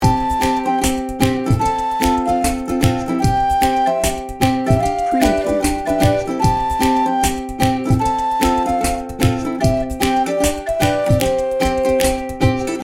ukulele kids loop; Bright and bouncy with a catchy melody featuring ukulele, recorder and female “la, la, la”

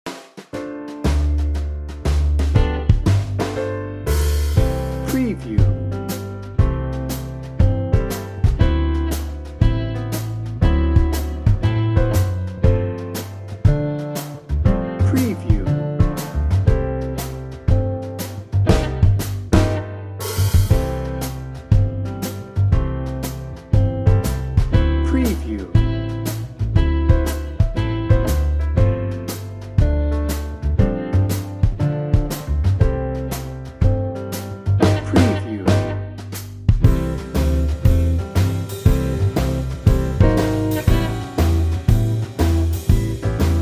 big band swing bed no horns

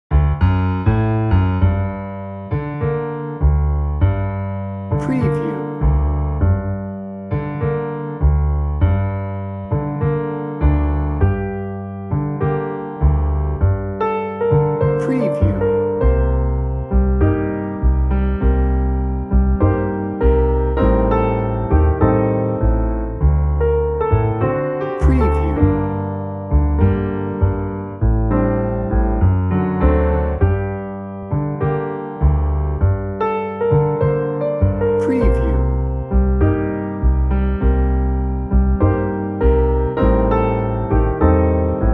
rhumba romance lite

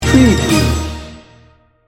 sting bumper

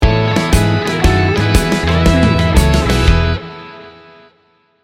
bouncy boys sting bumper

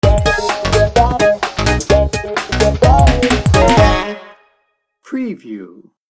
booty shaker sting bumper

